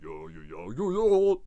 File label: Necromancer Attack Vocals